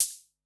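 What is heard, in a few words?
Analog MT40 Open Hat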